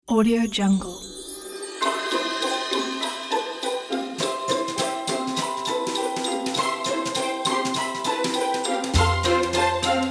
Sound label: a bluegrass christmas